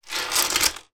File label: game monopoly game plastic houses and hotels scoop or pick up from table